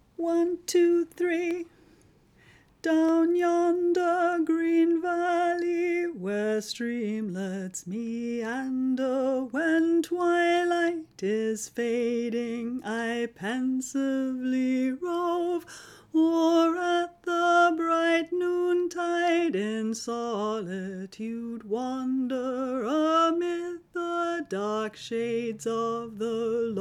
bass; The Ashgrove; Folk Choir music

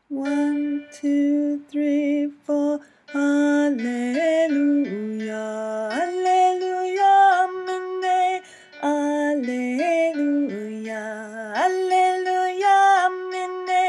middle part

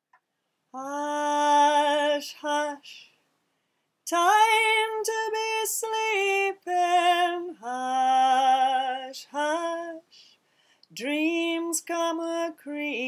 hush hush, Folk Choir music; morbheanna

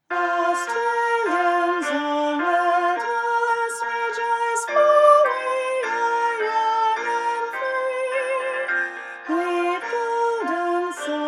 alto; Advance Aust Fair; Voices of Remembrance; Folk Choir music

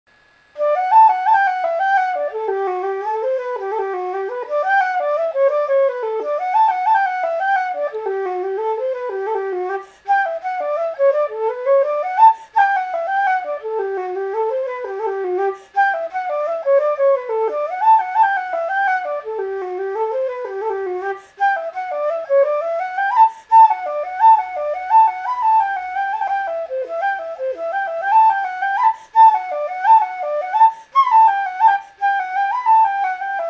85bpm

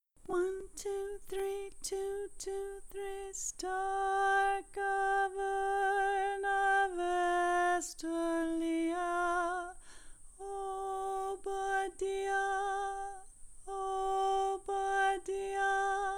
Unst Boat Song High Harmony on so

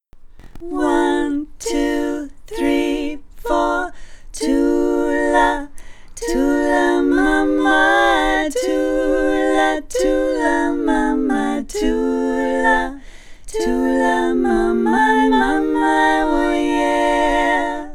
melody and two harmonies above

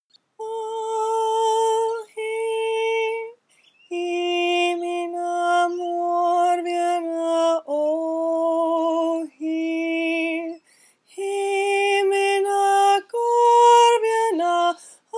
high, Folk Choir music; morbheanna

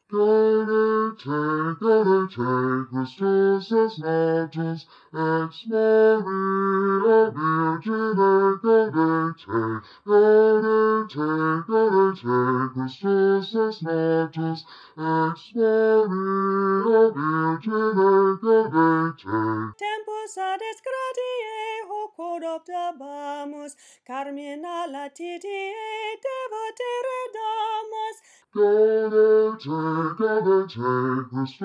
bass low octave; Folk Choir; Gaudete

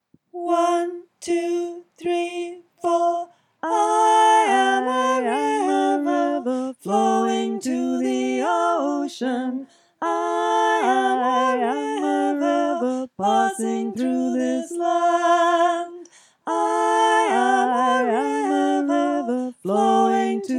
sop bass

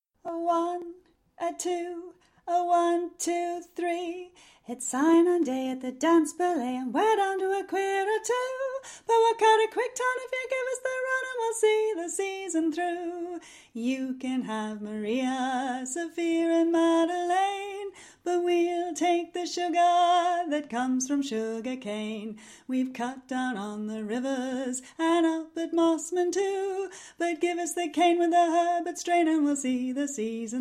alto harmony

